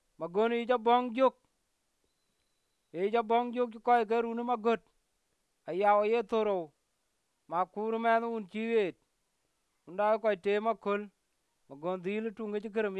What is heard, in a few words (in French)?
chant boeuf dinka